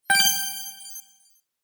Bright Magic Bonus, video game

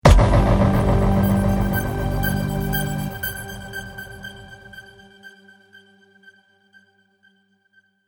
Smash And Sparkle Trailer Hit

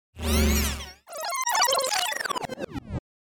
Flutter Feedback Left To Right; Feedback Tape Rewind; Production Element Imaging Element Accent Transition

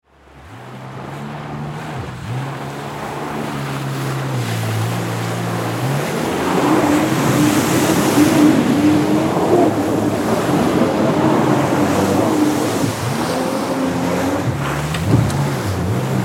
Jet Ski Circle, Watercraft, Ride, Around, Loops; Transportation; Boat

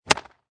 Sports Street Hockey Single Shot

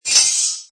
Metal Grill drag; Metallic; Multimedia; Lynne Music

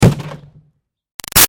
Impact Green Garbage Pail Hard; Gritty Scrape; Plastic; Materials; Big Room Sound

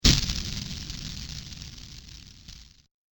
Crushed Bitty Impact; Digital Sound Effects

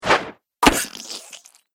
Dagger Throw Hits Victim; Combat Swing Sound Effects

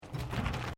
Bicycle Mountain Bike Road Peddelling Metal Grill; Bike near Tazawako Japan; Bicycles (Sound Effects:Vehicles)

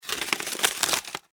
Foley Tin Foil Crumple; Crunches (Sound Effects:Objects)

